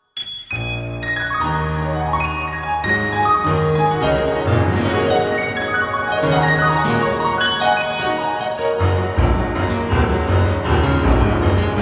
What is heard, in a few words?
Flying Rolling Waves